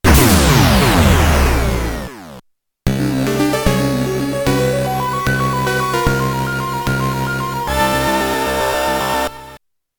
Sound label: DISAPPEARANCE (ゲーム・オーバー); Space Manbow OST; SCC Stereo